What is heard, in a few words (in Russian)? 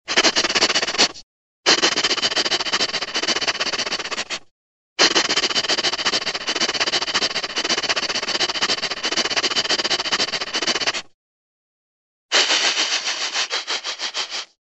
smeshnoe sopenie psa